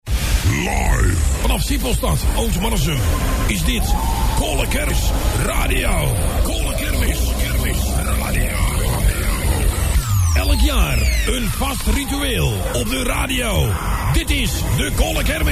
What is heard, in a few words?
Jingle; Koale Kermis Radio; Audio Fragmenten; Arkan Radio 2